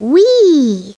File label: whee